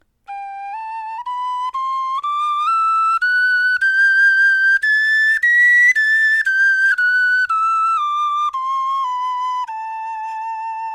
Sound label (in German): tonleiter, knochenfloeten